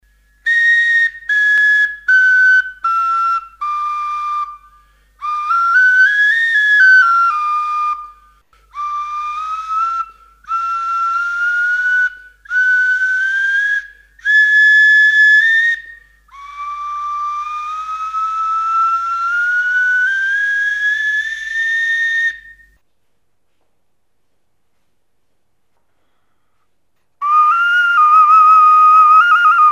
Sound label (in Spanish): ocarina ornitomorfa